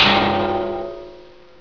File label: clanger; ambience